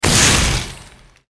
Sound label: ice bolt fire; magician